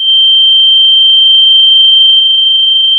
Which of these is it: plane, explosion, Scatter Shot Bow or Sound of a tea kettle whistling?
Sound of a tea kettle whistling